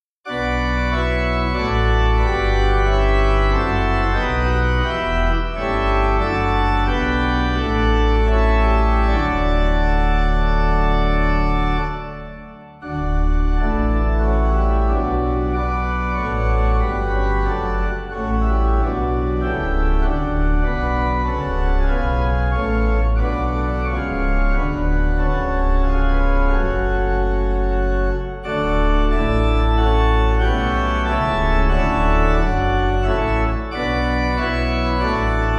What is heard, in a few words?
organ, hymn; cornwall; Hymnal 1982